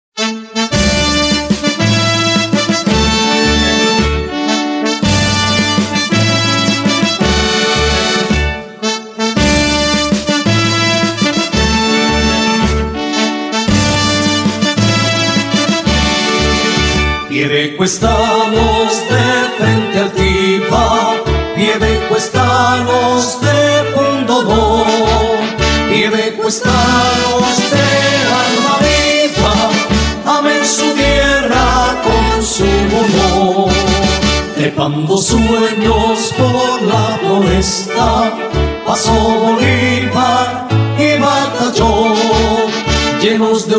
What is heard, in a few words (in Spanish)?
Himno Piedecuesta; himnos